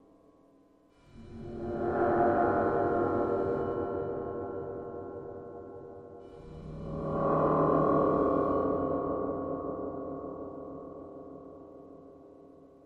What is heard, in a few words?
Alien Presence, drones & tones